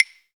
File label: clave; Roland LCDP03 Orchestral Perc; Orch Toys